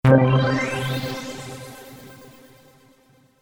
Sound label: Power Up